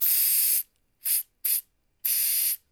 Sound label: HAIRSPRY; AKAI S-Series CD-ROM Sound Library VOL-6